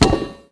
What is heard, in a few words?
iron; Mafie 1